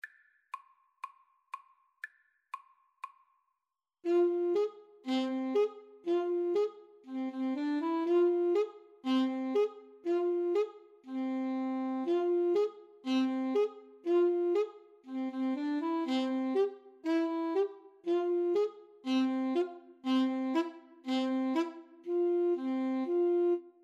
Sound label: Odessa Bulgarish 2 A SAX